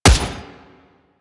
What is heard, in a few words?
Gunblade Automatic Shot, Weapons